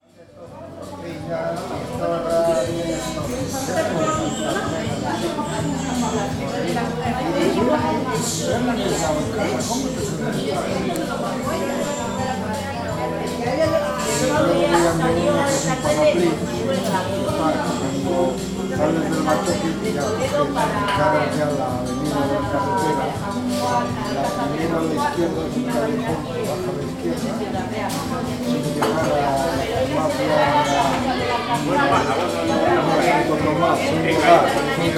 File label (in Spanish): ruido ambiente; bilbao; irrintzi; soundfood